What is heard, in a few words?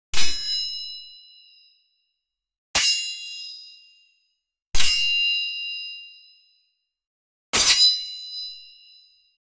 a mystical sword slash; weapon